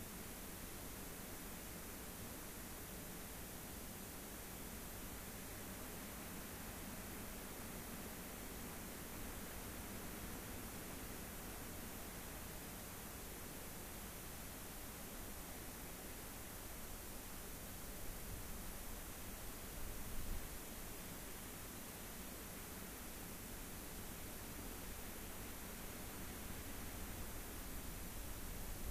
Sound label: rayol farm; SEAMED